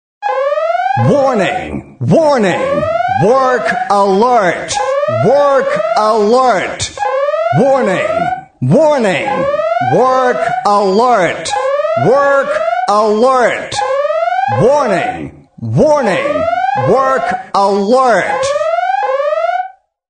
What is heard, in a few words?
Work Alert